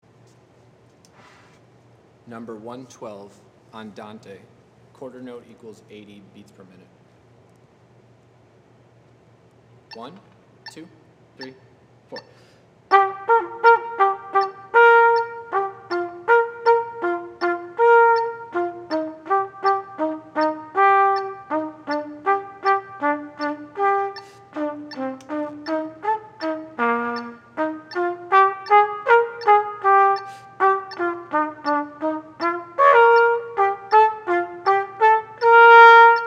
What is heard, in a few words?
trumpet etude